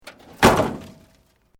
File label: Metal Light Movement Minor; Materials